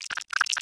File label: headcrab pain; mysteryhouse